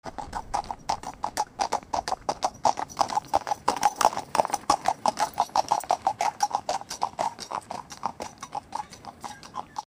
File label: horse and buggy walking b, cobblestone